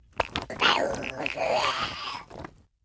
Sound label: ratmoth pow